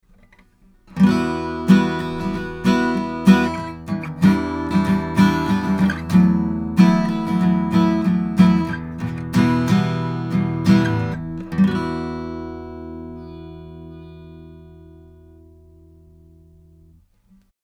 RB-500 with FetAmp; thomann fetamp; tbone

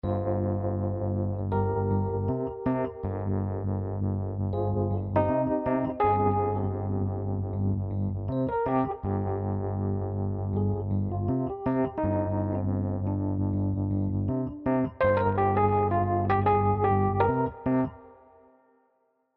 Ballad Phasamp

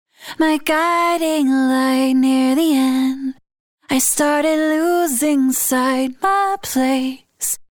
Vocals Input Auto Optimise Compression Saturation

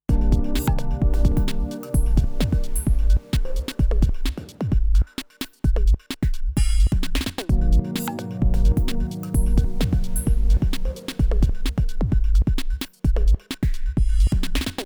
Midas 512 EQ Elektron Song Bypass

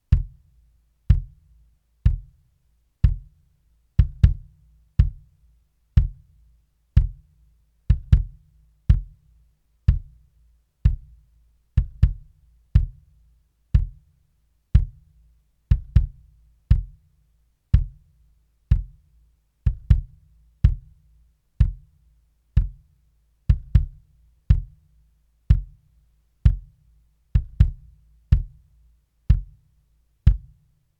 Fredenstein F200 Kick

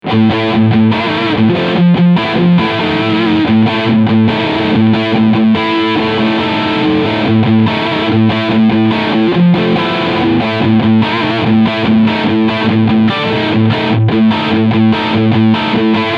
Chapman ML3 Modern Riff Steg HB; Incarnadine

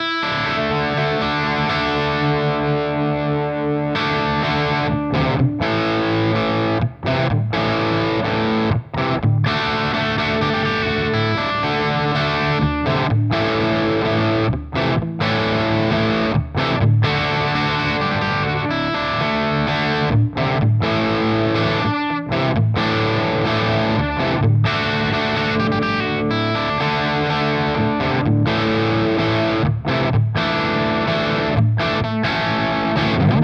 REVV G20

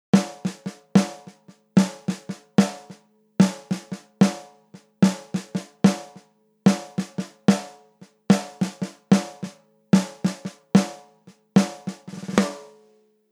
Snare dry; Heritage Audio HA-609A

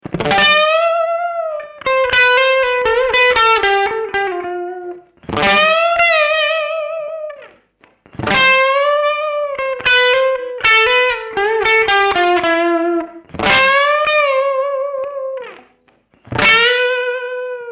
710 light gain

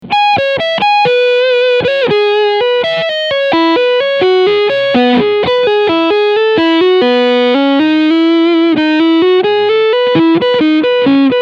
yamaha revstar rs720b overdrive front humbucker

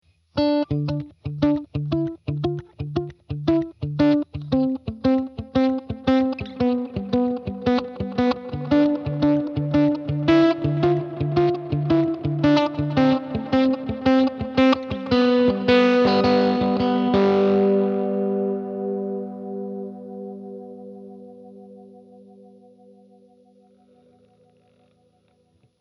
Classic FX guitar Galaxy Tape Echo

